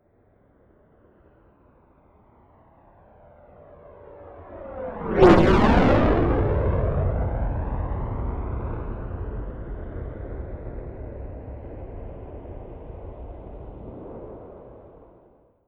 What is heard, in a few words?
military jet fulcrum flyby left to right close